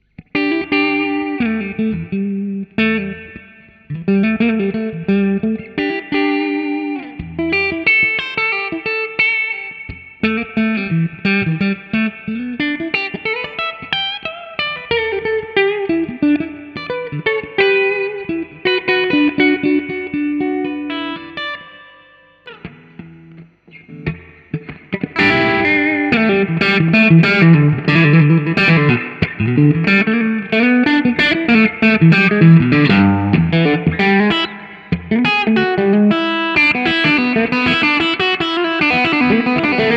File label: ST54 middle